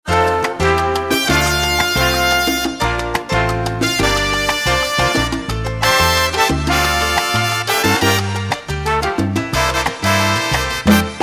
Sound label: ring tone